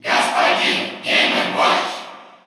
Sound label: Cheer Russian; Mr. Game & Watch; SSBU